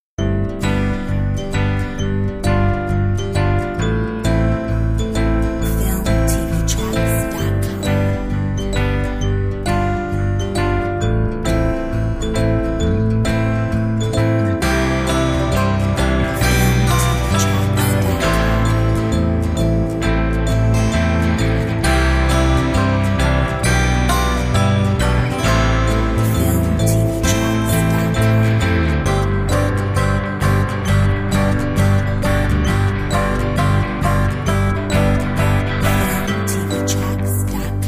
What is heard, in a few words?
Boutiquing